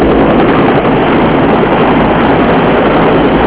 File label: blackhawk